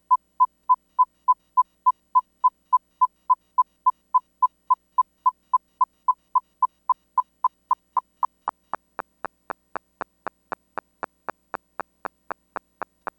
Click, un suono molto breve, la cui durata si accorcia ancora